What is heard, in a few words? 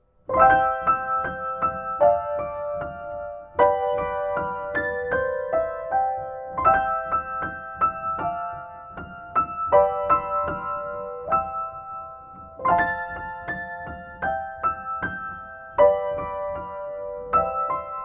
Christmas Piano